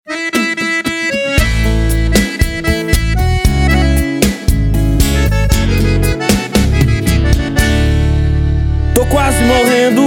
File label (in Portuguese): sertanejo universitário sofrência